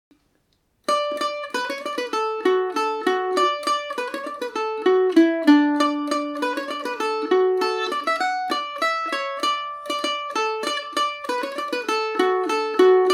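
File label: D major paris swing, irish mandolin; john ryans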